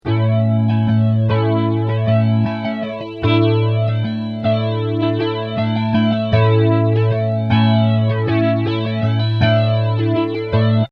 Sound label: flanger; man: 12, man/auto: 12, rate: 12, recycle: 12